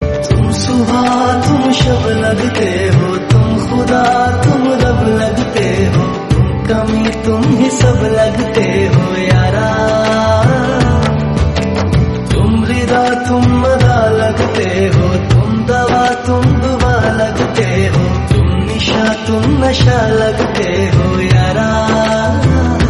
sad hindi

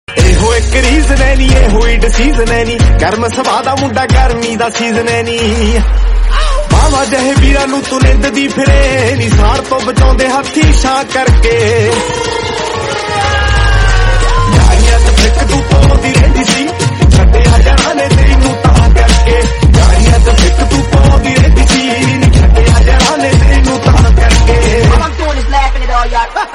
Punjabi song

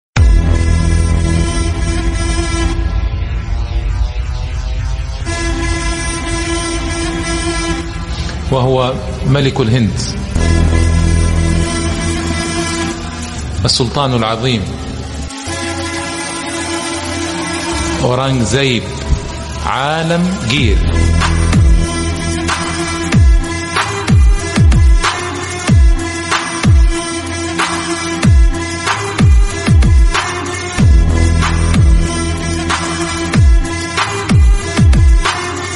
attitude bgm theme instrumental

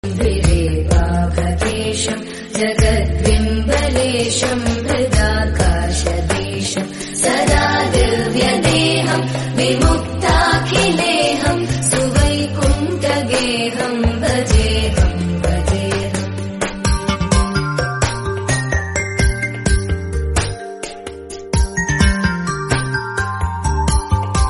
devotional tamil

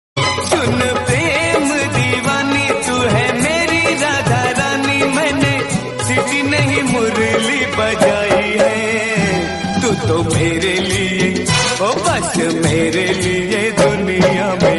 hindi; radha rani

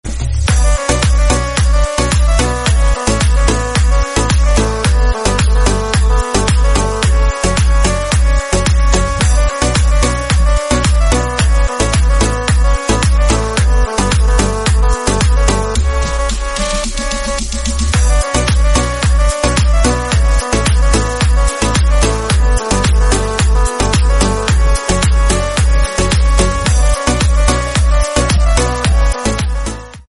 turkish remix; turkish cilgin dondurmaci

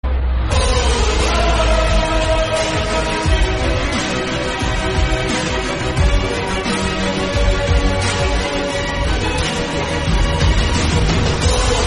intro background music; uefa europa league europa conference league

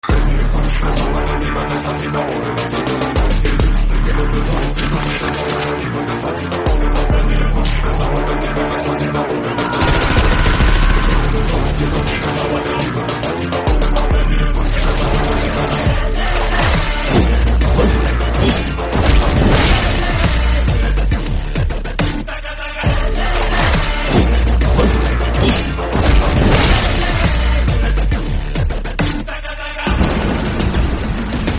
tamil movie bgm; cobra

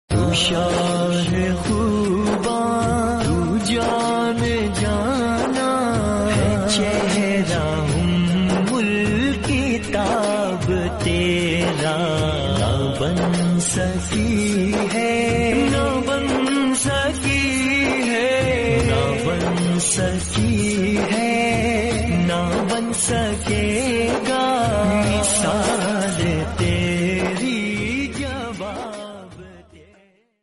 islamic song

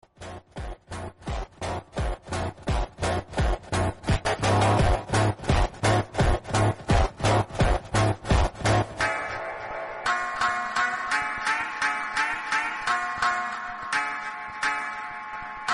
remix beat

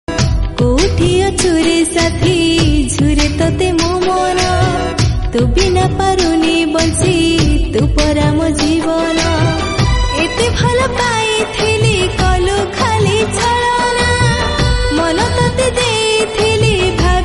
Odia song

